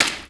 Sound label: leather bash wood